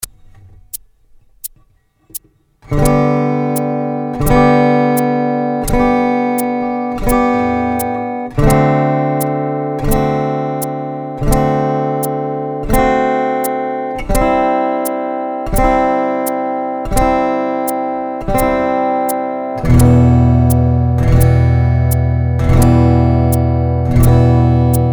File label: Lets strum